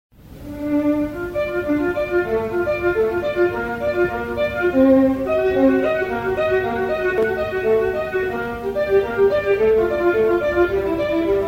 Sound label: violin excerpt